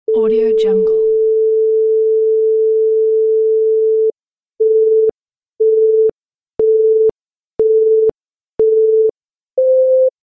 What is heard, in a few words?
ski alpine start beeps; fxprosound